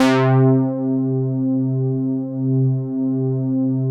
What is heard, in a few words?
OBERHEIMS